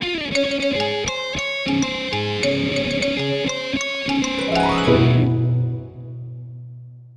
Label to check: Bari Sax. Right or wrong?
Right